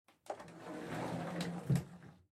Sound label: Wardrobe Opening; Game rip; MiSide